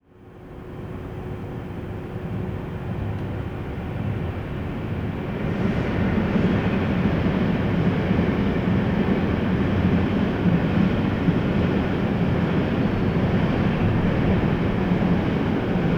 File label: Outside Ambiance Emergency Vehicles